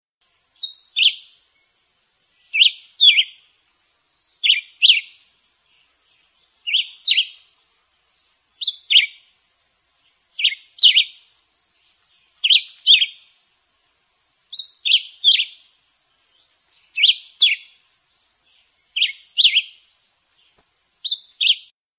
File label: Black whiskered Vireo